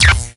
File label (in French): Balle Power